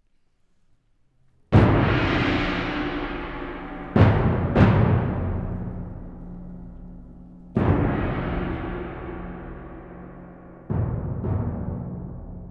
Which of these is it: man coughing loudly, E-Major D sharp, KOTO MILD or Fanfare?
Fanfare